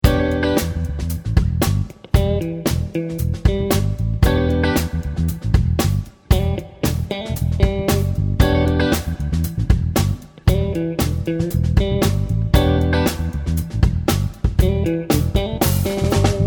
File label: Funk Rock Beat